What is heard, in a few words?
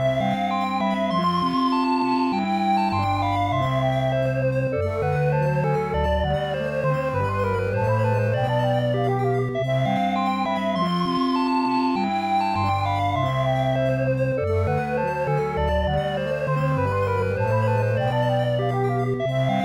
Round Clear